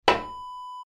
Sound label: toilet seat cover up